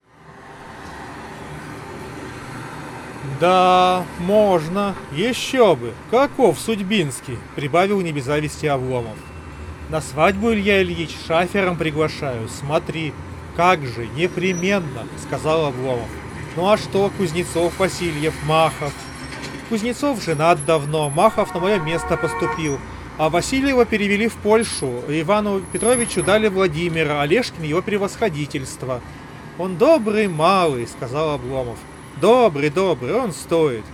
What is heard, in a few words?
Recorder noise; cmf buds pro 2 by nothing